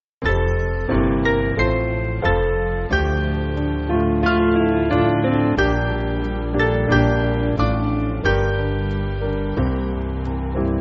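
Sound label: Piano, Music Backing; Mainly Piano (CM)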